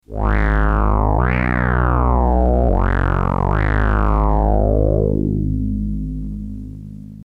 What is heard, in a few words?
low wow; OSCar